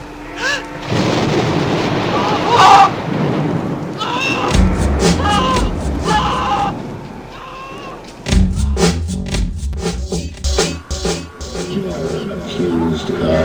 alert theme; skibidi toilet epsiode69

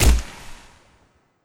Flarehand Shoot; grabpack upgraded; pp3

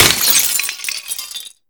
glass break; big bug

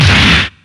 punch; amalgal; The Monster Plane; kolmods melee

